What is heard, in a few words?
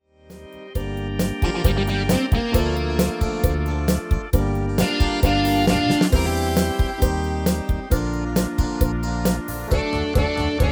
MIDI